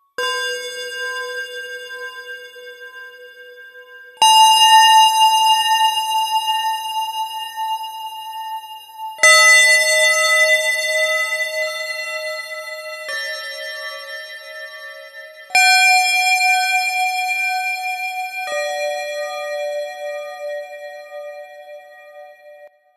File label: ding doung dingoooooo, ringtones